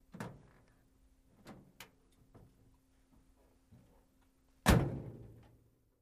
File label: Fire Truck Door Med